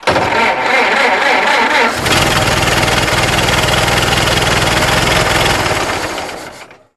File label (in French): Demarrage